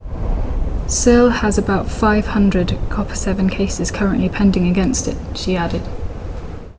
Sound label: Reverb near